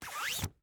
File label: umbrella remove